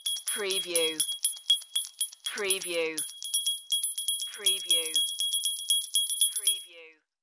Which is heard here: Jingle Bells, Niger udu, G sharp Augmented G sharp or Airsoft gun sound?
Jingle Bells